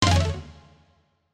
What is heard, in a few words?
Damage; Spider-Man vs Doc Ock